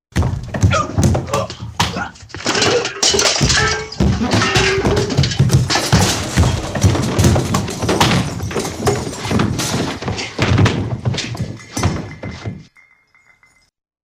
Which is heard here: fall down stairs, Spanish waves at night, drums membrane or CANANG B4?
fall down stairs